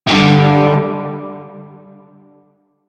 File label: Shawzin Chromatic Chord E; Music; Warframe; Narmer Shawzin